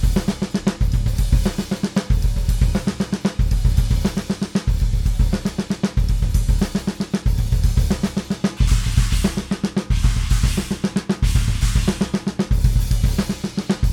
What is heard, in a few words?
quick drum test